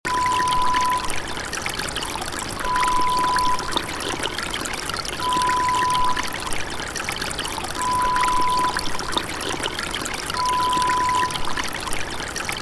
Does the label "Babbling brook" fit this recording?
Yes